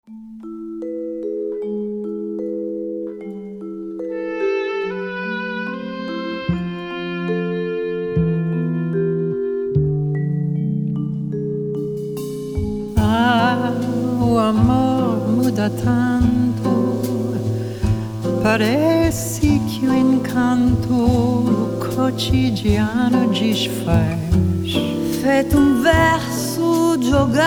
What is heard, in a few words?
Piano